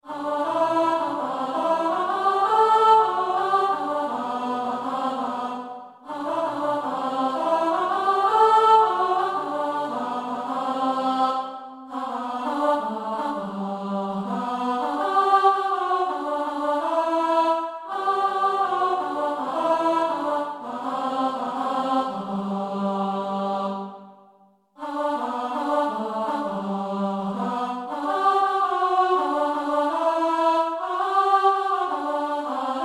Green Among the Gold Chorus + Sop

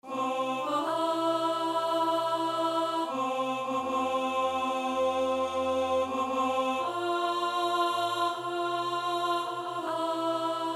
Chorus alto

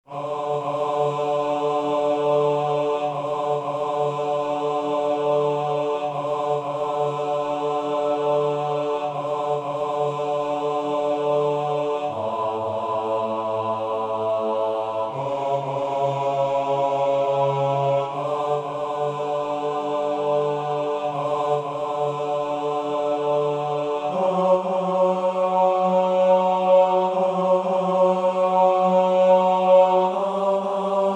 Bass

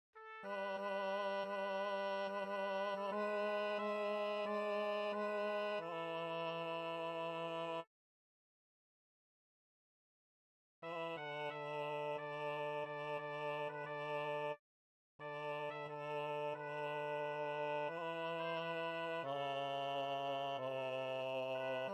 Sydney Rose Bottom Harmony

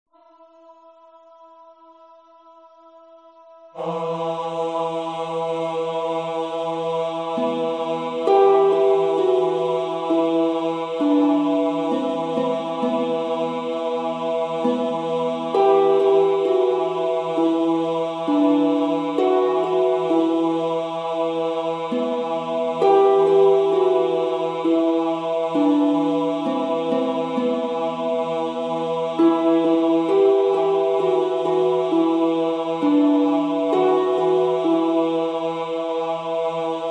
Lament Bass

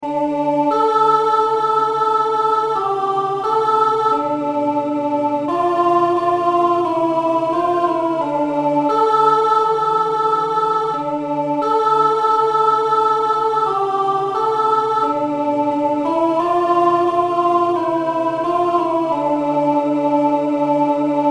alto

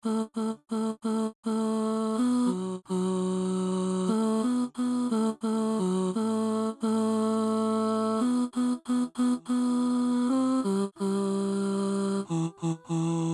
Tenor

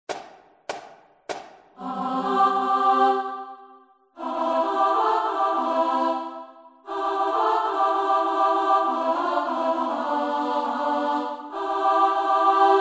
All Chorus

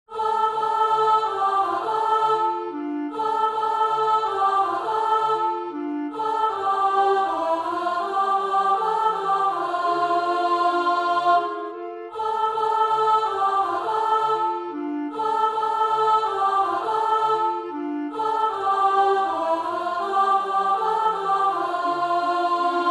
Alto

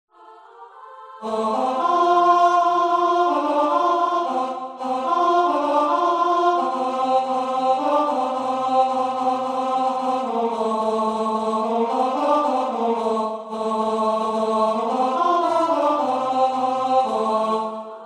Tenor from Chorus

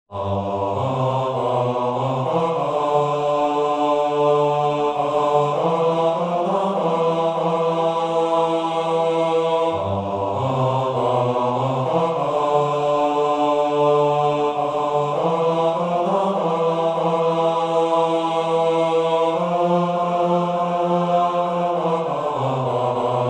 Bass

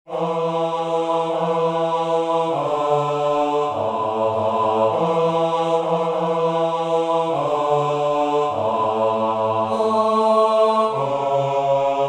Carol Lights Bass

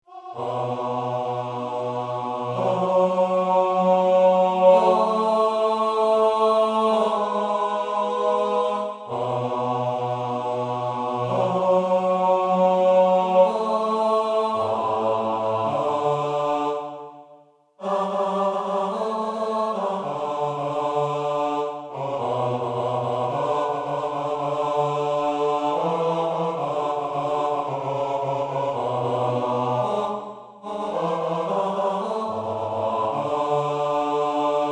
Bass

